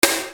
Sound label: Snare Dr